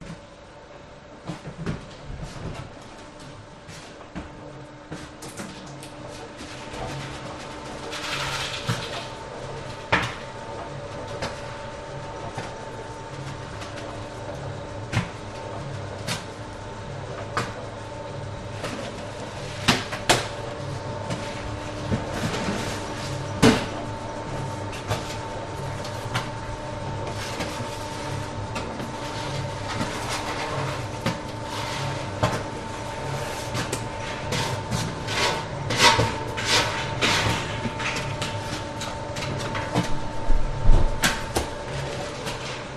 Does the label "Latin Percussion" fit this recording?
No